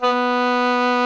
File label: SOLO WINDS